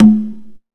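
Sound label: 127 drum 2 R, Fresh Disco House; USB Soundscan vol.20; AKAI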